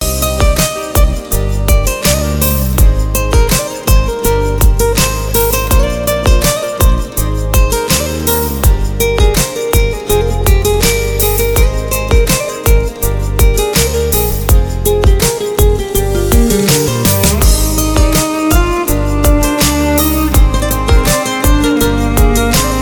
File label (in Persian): gitar ghamgin; زنگ اینستا